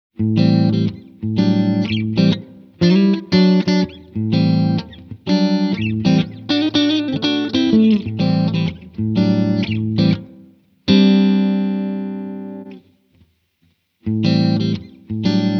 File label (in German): Blackstar AMPED1 clean Endstufenvariationen